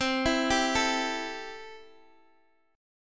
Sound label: C 6th, C, down, Guitar, Open G